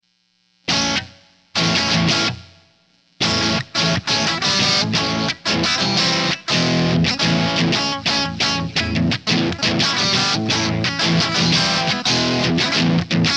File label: electric